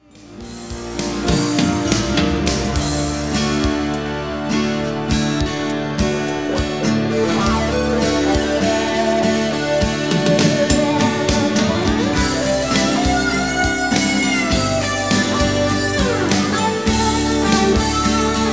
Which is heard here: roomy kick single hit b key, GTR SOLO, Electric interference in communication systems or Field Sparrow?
GTR SOLO